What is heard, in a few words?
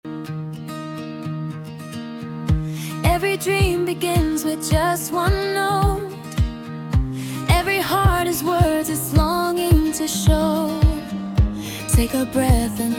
singing used voice